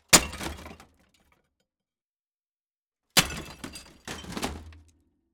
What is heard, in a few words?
Metal Impact Smash Move, car destruction; Coll Anderson